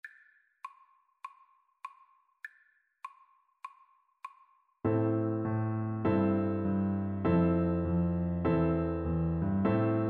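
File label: euphonium; Baritone Horn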